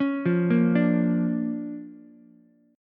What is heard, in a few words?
D-Minor Major 7th Sharp 5th-D-down-Ukulele; DmM7#5 arpeggiated; JGuitar